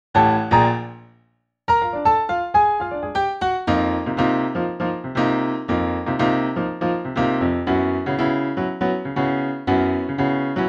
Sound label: Piano; Copa; Singing Audition Pieces; Conchita